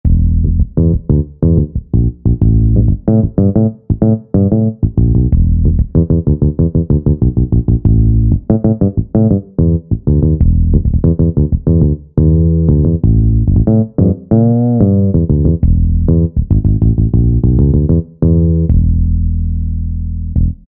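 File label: Click; Sonic Reality Modern Songwriter; Clik MusicMan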